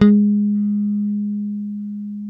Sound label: HIPH G#4, Bass, HIP-HOP; Spectrasonics; Bass Legends CD 1